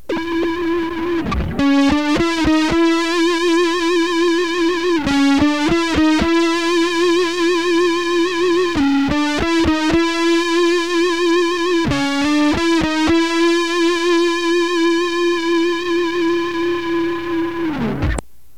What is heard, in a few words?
guitar